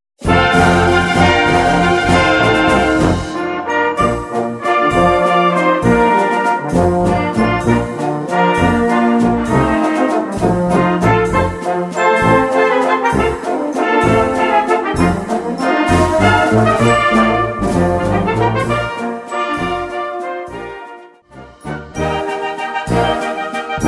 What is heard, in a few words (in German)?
Walzer